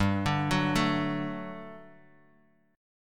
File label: G Major 7th Suspended 2nd G down Guitar Half Step Down